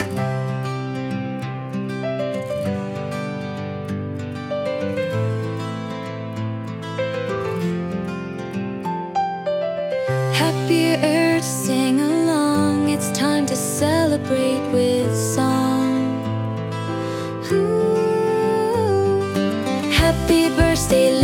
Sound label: Happy Birthday Song